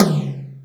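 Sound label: Hi Flange, Alesis D4 drum machine tom; 300 Drum Machines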